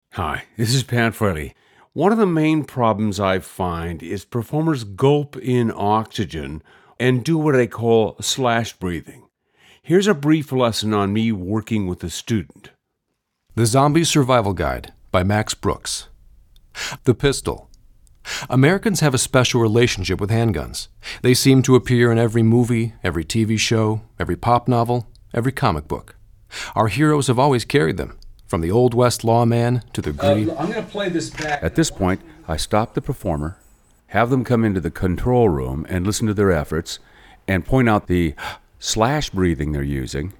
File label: Breathing Quieter